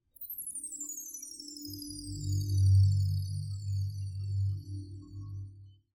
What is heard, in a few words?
Chimes